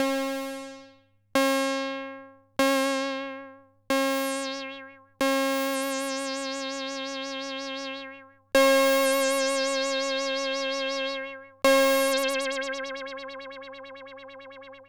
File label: MC202 Lead Synth